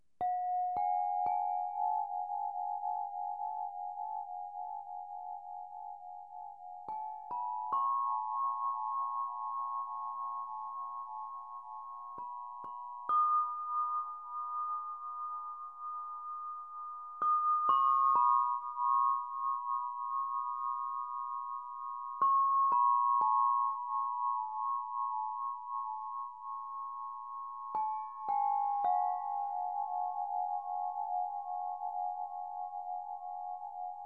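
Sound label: Arcana vibrato, Lekka harfa kryształowa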